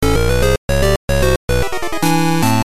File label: You Lose; Bomberman GB